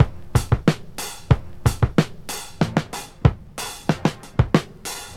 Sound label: 93 bpm drum groove d sharp key, Free drum loop sample - kick tuned to the D# note. Loudest frequency: 888Hz